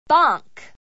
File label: bonk